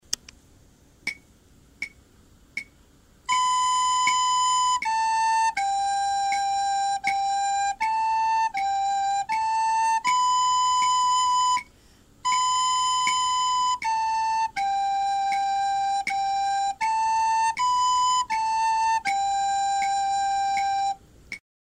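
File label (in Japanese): やさしい風, リコーダー; 成隣小学校 WEB音楽室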